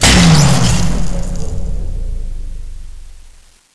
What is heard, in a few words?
light power off; XDM; buttons